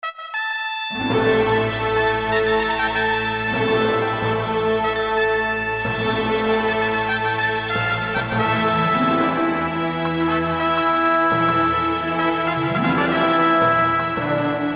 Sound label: Fanfare; Adventure